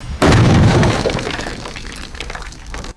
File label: small explosion with falling debris; explosions